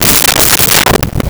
Dull Wood Hit Bounce; Vintage Cartoon; Drums & Percussion

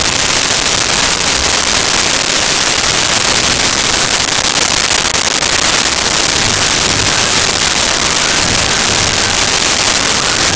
battle loop, Music

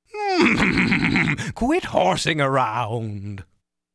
horsing

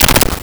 Teeth Clack Soft; Vintage Cartoon; Foley

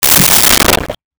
Sink Drain Gurgle; Liquid

